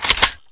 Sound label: aug bolt pull; weapons